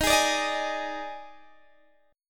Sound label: Eb Major 7th Suspended 4th, Guitar, Half Step Down